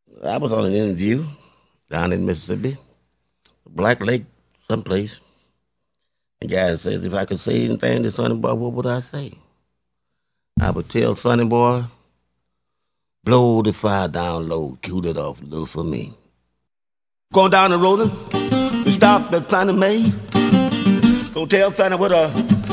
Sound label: Vocal intro